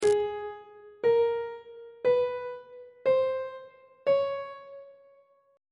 g sharp dim3 min3 maj3 aug3 intervals on treble clef